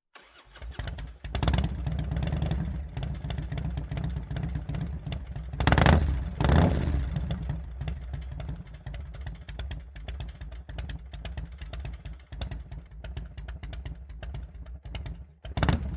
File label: harley start idle